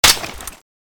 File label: mg36 draw, weapons; csco; csgo; mg36xmas